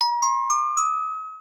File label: level up; experience